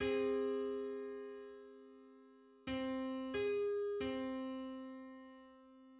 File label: Seven-hundred-ninety-ninth harmonic on C